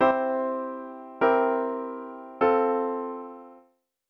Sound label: Secondary dominant example